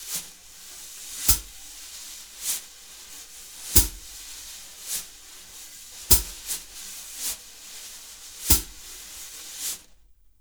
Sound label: BALLAD, Jazz Latin Drumloops